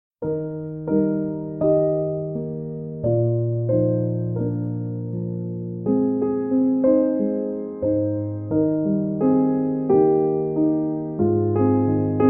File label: Instrumental Piano